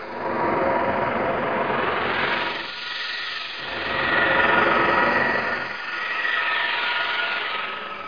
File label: dome energy rush; Gunlok; environ